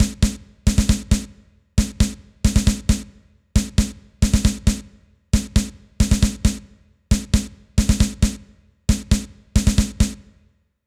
snare Roll must Fadeout; super stone legacy

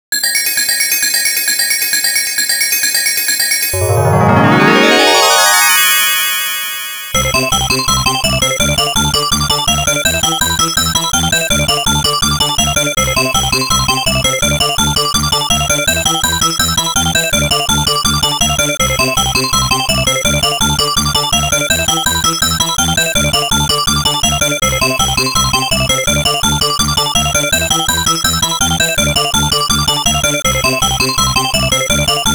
Extend (Bonus Music); story of bubble bobble vol i; arcade machine

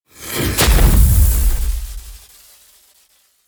electric bomb

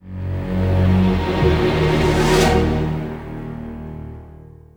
Gameover; curse of atlantis thorgals quest windows gamerip 2002

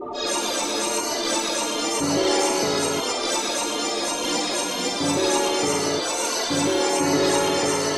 Monument Park D - Ambient

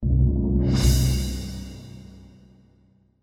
Stingers; Goblins First Fight

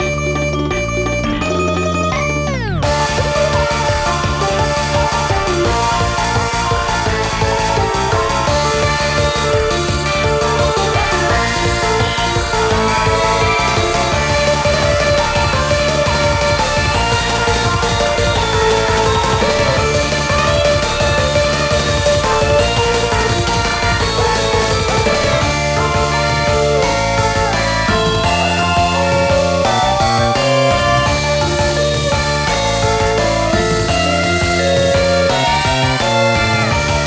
Dark Forest; Friday Night Funkin' Mario's Madness; PC mod gamerip

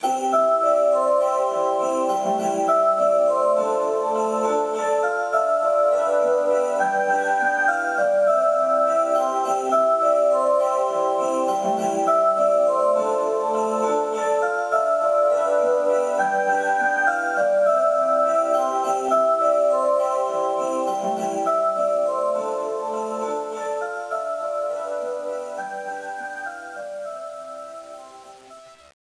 bgm cafe; Yakuza Ryu ga Gotoku Kiwami 2

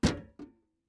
metal land; teardown; ps5 windows xbox series xs

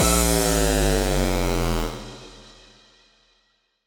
Retry Jingle; Friday Night Funkin Hyper Funkin

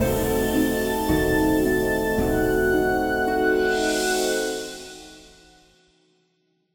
Stealth Detected; Sarco; tintin reporter cigars of the pharaoh

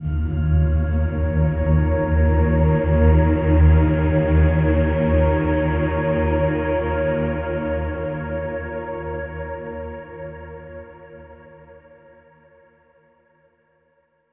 Drone

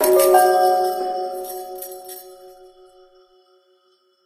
intro Ominous; Zen Bound 2

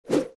Twirl; Fancy Pants World 1 Remix

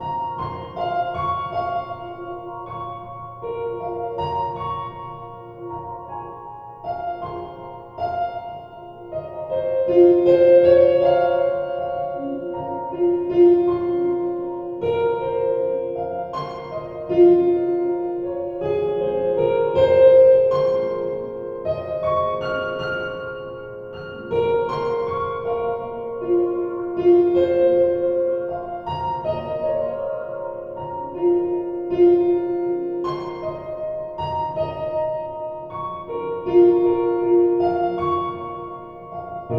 Diegetic Piano 79BPM

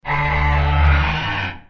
Godzilla Hit React; Godzilla Smash3

